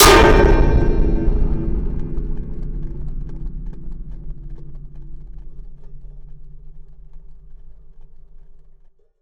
Raw, Wire & Tin, contact mic

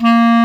clarinet A#2; InVision Interactive; Lightware VOL-1; Instruments & Percussions

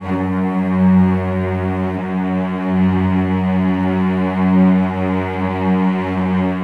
F#1, Celli; Best Service ProSamples vol.51; Classic Orchestra 2

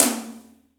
ROCK TOM; Big Fish Audio; Synth City

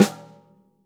snare snares on; JazzFunkKit

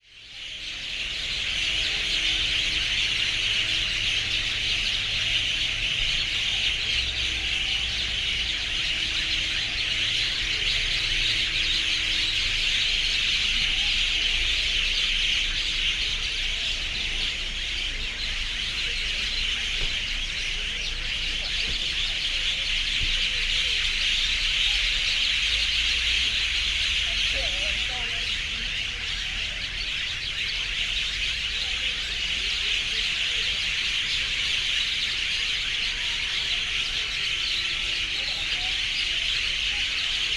Birds, Scream, Extreme; City Sounds

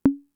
Conga, Fricke MFB-522

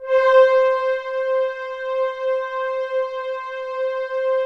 OB LOW

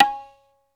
TABL NA, ETHNIC PER

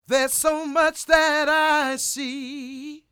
croon, Vocal Planet Blues Soul; Spectrasonics

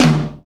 TOM, KIT Real-Kit; 90 s Sample CDs; Sound & Vision - Gigapack I CD 1 (Roland); Real-Kit s10

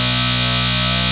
RAVE BEEP; Best Service Alpha Dance vol.2

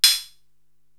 HH Trihat Close, Korg TR-Rack drum machine

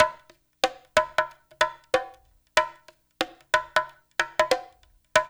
DUMBEK, Loops; East West; Funky Ass Loops

